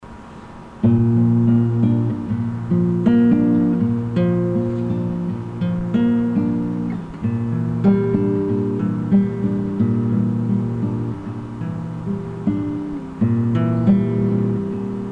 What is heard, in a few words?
Guitar